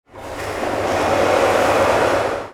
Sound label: Abnormal, Abstract Foleys